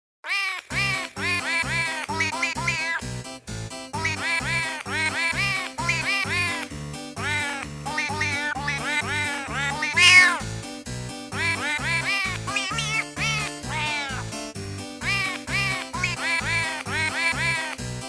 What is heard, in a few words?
Cat Ringtone Harp